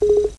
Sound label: computer beep